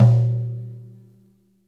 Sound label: floor tom - snares on; JazzFunkKit